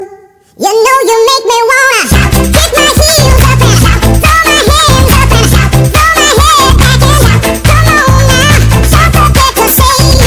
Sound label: chipmunks shout